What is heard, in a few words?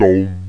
DUM C3, ELEC VOX; East West - Global Sounds